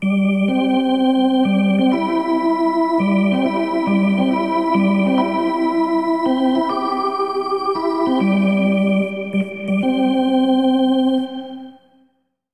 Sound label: Hockey Organ